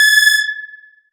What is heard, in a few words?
Release A6, Foundation Analog Synths; Ableton Foundation FreePacks